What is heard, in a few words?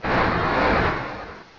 Water Gun; pokerogue